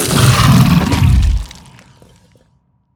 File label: worm death, creatures; Factorio